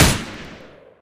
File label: smg fire; Left 4 Dead; Source Engine; gunfire